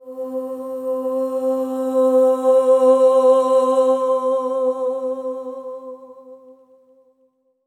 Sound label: SOP X2 C4, Symphony of Voices; Spectrasonics